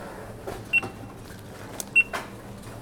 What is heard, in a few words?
Pips